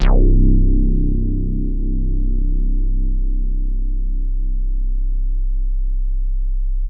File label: Big Synth Basses